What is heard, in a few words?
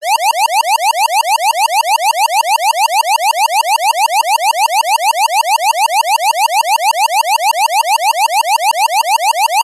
emergency alarm siren